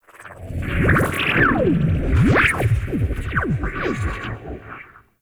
Ship Laser Whip; Warframe; Corpus Ship Remaster

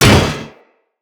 Drone Fall Impact; Grineer

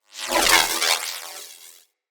Voltaic Sword Projectile Whizz By; Characters; Enemy; Abilities; Sinecure; Banneret

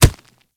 Kubrow Step Dirt; Warframe

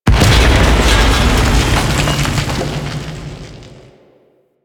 Outpost Machine Destroy Rock Fall; Warframe; The New War; Narmer Outpost

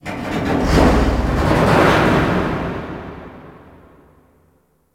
Cargo Elevator Distant Metal Groan; Warframe; Guild

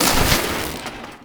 Debris Fabric; Ambience; Gameplay; Warframe; Entrati

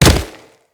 Land Dirt, Footsteps; Enemies; Kubrow

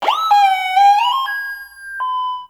Car Alarm Destroy; Warframe; Ambience; NineteenNinetyNine

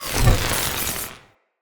Galvanic Zapper Cool Down

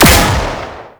Auto Turret Shot; Venus

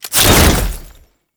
Ammo Cast Whoosh; Frumentarius

